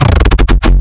F Sub Fill, bass

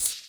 Shaker; Equipped Music; Loungin House

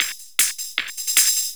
LOOP, Eurotech; Sounds Good

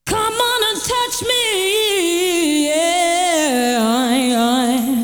Vocal; ZERO G - Datafile DISC 2

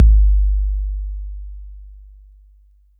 Roland 808 BD

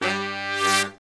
BRS SHRT, R&R Horns, Sect; Roland L-CDX-03

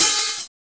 LO-FI OHH, LOFI HATS

